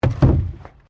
PLASTIC BARRICADE IMPACT

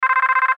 Radio Morse Code; Morse Code Erase; Morse Code Sound Effects; Blastwave; Warfare

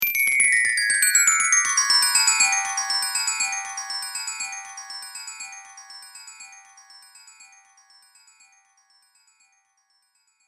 Chimes High To Low Slow Delay, Windchime

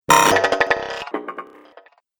Droid Metal Rattle, Robot Sound Effects

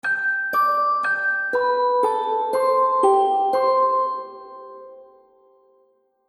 Happy Baby Game Music; Cartoon Music

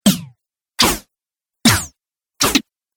Robot Strike Attack Collection; Robot Sound Effects

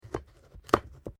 PUMPKIN CUT

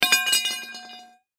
Crowbar Drop On Cement; Hand Tools; INDUSTRY

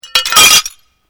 GLASS SHARD SMASH

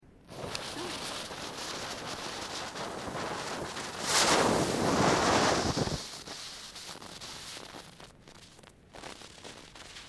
Fireworks Smoke bomb, Domestic Fireworks; Fireworks (Sound Effects:Backgrounds)

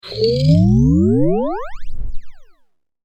Engage Hyper Drive, spacecraft

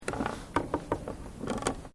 Wood Creaking; Material components for constructing more complex sounds; Materials

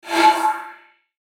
Whoosh Double Swell Flange; Blastwave; Whoosh Sound Effects

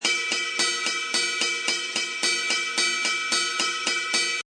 Ride Cymbal Riff, Ride Cymbal Loop at 110bpm.; Drum Loops

